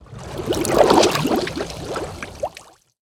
Underwater Movement Death Underlay

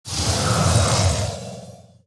Spark Engine Underlay Attack

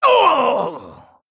Male Human Death

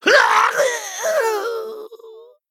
Male Kobold Death

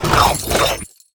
Toothy Chest Attack

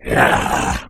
Flesh Eating Ghoul Attack

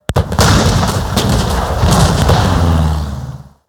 Skybarge

